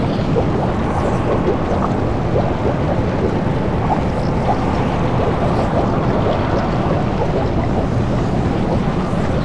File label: attack lava field medium